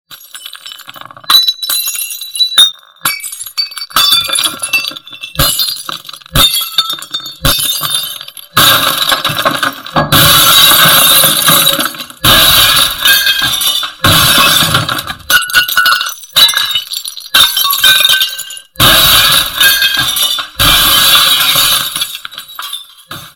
Breaking Glass Ringtone

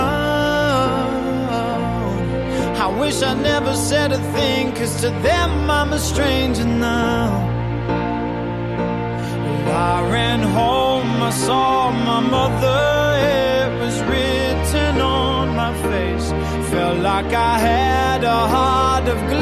ringtone

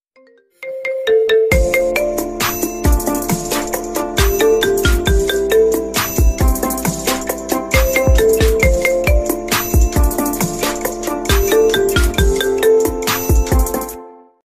iPhone 11 Ringtones

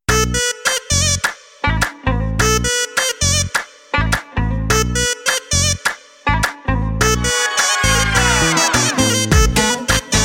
Samsung Galaxy Arabic Ringtone

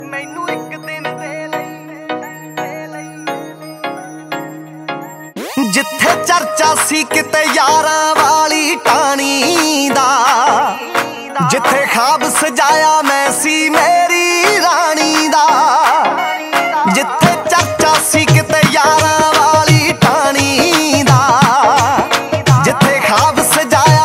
Punjabi Best Song Ringtones

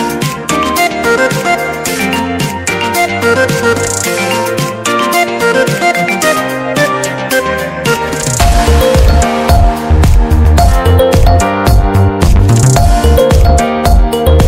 Lg Lifes Good Funky Ringtone

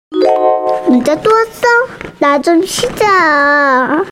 Cute Japanese Notification Ringtone

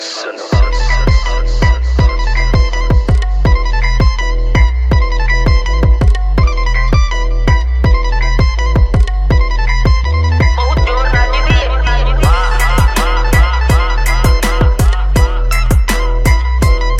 Punjabi New Music

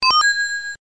Oppo Notification Tone

